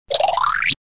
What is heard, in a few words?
flip; Bible Fun Pack; Education Publishing Concepts